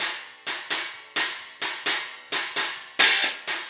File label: Cymbal and Hi Hat